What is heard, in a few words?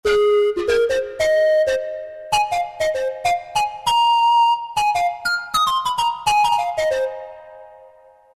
Bottle Blow; Roland MT-32